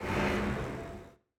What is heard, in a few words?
ambience room dark temple pentagram ready